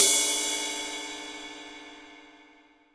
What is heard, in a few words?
Real Open, Hats